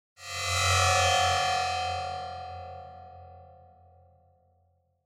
Cyber

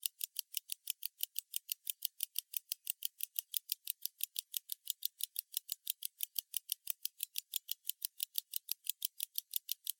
Pocket Watch Tick Hand Lid Open; 懐中時計; チクタク 手 蓋開

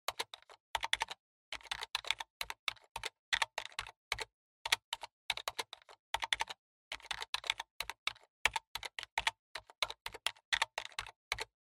PC Keyboard Ten Key

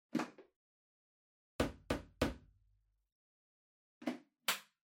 Vacuum Cleaner Far Dust Cup; 掃除機; 遠い ダストカップ

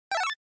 Shooter Item

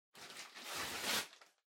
Packing Paper Far Tear; 梱包紙; 遠い 破る; 梱包材

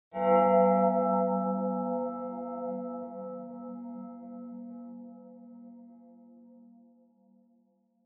Horror Accent Low Long

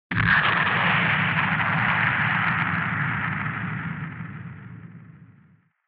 Retro Anime Jet Low Long

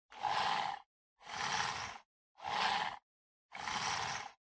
PC Mouse Move Long

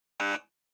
Quiz Buzzer Short

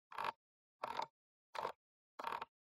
PC Mouse Scroll

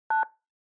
Telephone DTMF 1

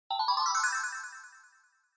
Scene Change Delay Slow Short

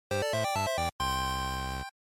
GB Clear

